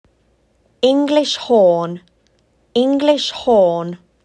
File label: English horn